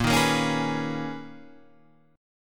B Major 13th A sharp, guitar

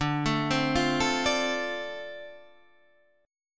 D-Minor 7th D Guitar Half Step Down, chord

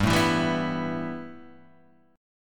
A 7th Suspended 2nd, G

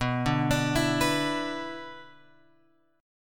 B-Minor Double Flat 5th; Bmbb5 Chord {7 5 X 7 5 7} arpeggiated

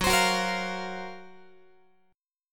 F sharp Major 7th Flat 5th, down, Guitar; F#M7b5 strummed; x,14,15,16,14,16; 4A,4E,5A,5D,5G#,6C#; chord shape

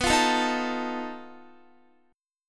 B 7th Flat 5th B Guitar; B7b5; strummed

